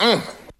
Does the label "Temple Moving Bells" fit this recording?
No